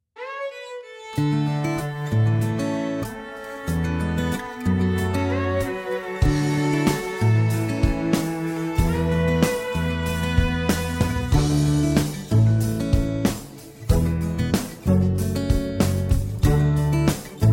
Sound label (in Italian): santo e il signore base